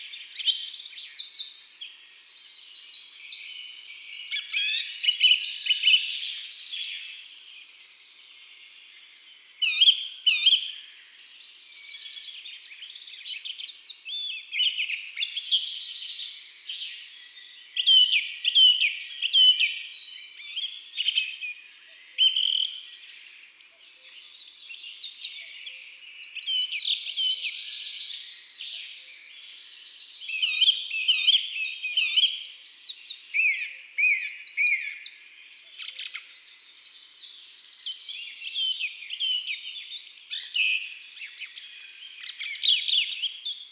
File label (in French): Troglodyte mignon Chants d'oiseaux des bois et des forets